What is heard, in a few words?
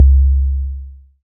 BD, Synth; Sound & Vision - Gigapack II CD 2